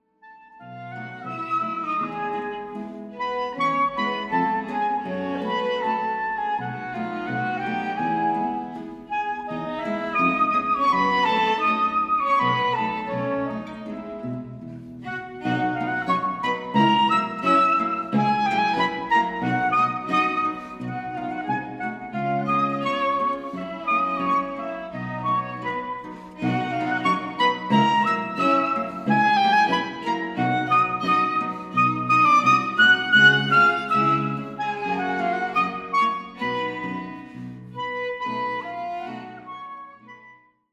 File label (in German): Polka, schrammelgeigen; Rendez Vous Polka